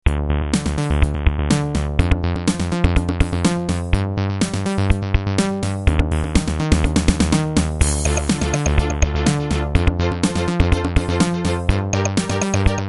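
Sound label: action, stock game music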